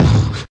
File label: phat bd, BeatBox Male